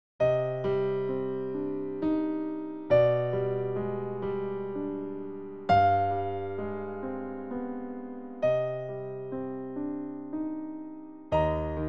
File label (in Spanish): escala